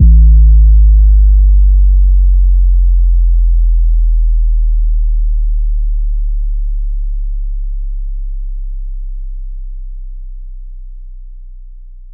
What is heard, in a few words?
phonk kicks oneshot 808 A; kick drum